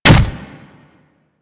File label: Claw Hit; Manic; Attack